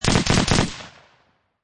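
Machine Gun Heckler and Koch MP540 Short Burst Triple; Machine Guns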